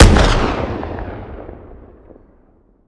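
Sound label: weapon pistol mag fire player atmo ext; gmod; viper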